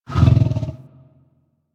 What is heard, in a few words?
Interact Affection Closed Mouth Short, Kubrow; Warframe; Tame